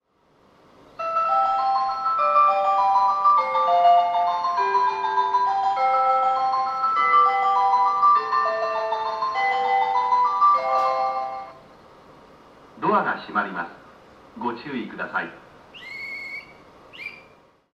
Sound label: melody, keihintohoku